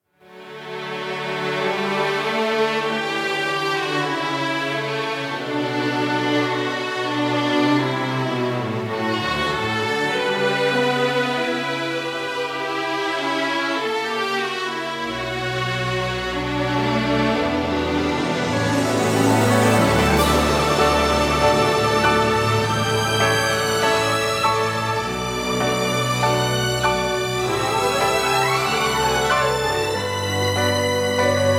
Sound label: 100 bpm D, Piano Orchestra; 4/4